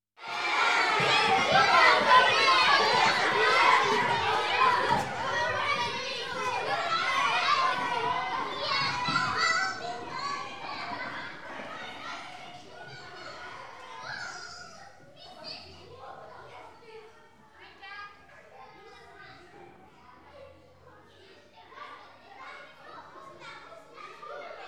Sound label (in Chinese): 学生吵闹冲出教室